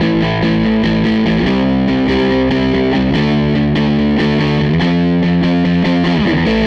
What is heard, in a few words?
Les Guitar Loop